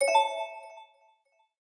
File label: system notifications; Amazon Fire Phone